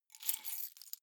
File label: key ring grab stone; objekte; schluessel